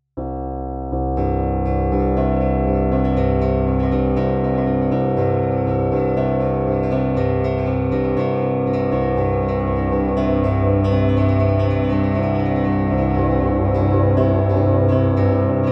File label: ARP Bass Auto-Drone String; Preset spotlight: ‘ARP Bass Auto-Drone String'; Demos produced by Electric Himalaya; AAS String Studio VS-1; Dreamstate